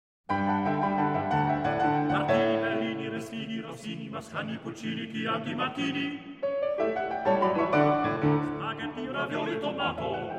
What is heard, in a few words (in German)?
Italienischer Salat